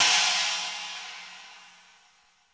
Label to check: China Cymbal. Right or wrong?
Right